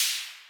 perc, Drum Machines, Clavia Nord-Drum; 300 Drum Machines

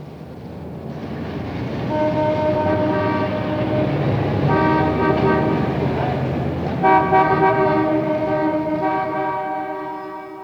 Traffic, Environments; Sonic Foundry (Sony Creative Software); Ambient Grooves

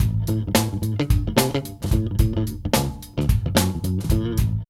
LOOP

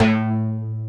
SLOPPY BASS

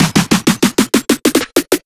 Fills 128BPM, Clubsounds

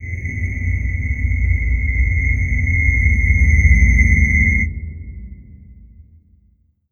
amb, Alien Ambi, Chillout; Best Service ProSamples vol.36

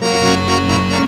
ACC BRK, ACC BREAKS; Best Service; World Colours

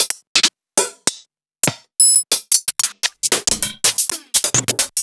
Closed Hihats; Sliced

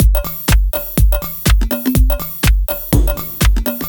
D 123bpm, House Drumloops; Chickenfarm; Zero-G House Fabrik Drumloops

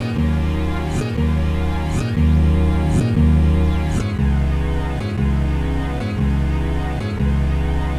Pad Fx Loop 120, Loungin House; Music-Fx Loops 120; Equipped Music

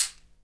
CAXIXI

